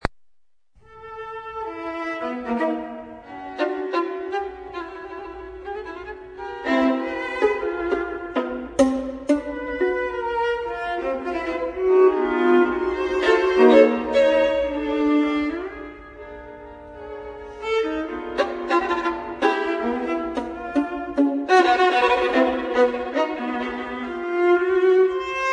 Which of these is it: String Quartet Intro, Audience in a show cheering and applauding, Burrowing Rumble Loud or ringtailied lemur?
String Quartet Intro